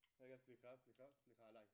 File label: Kit, 160 BPM